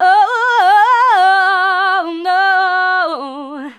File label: Female vocals and robo-vox